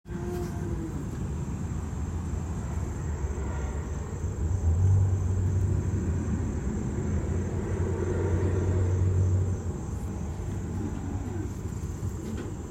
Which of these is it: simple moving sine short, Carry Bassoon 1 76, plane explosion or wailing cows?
wailing cows